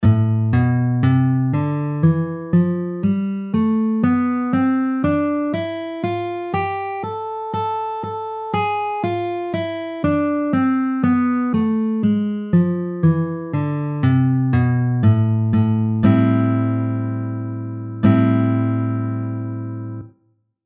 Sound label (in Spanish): escala la menor natural